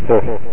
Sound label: laugh